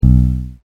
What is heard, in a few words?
agog bass